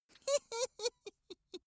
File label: dwarf laugh sneaky